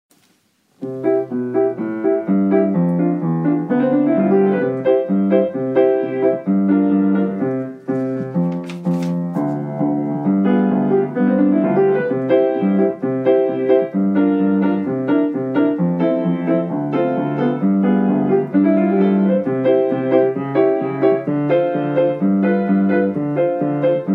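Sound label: piano accompaniment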